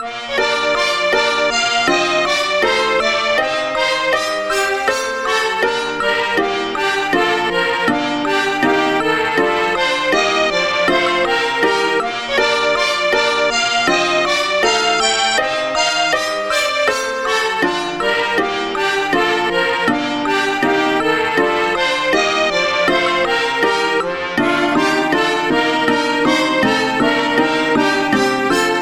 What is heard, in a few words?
brass